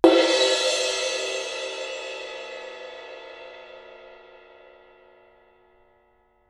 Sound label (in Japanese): シンバル; 体鳴楽器; 打奏